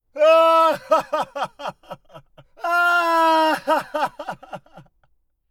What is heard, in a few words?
man laughing; human